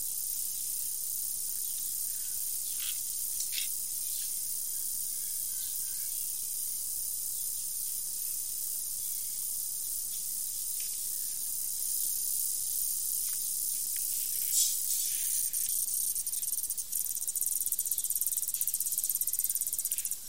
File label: Conocephalus strictus; Arthropoda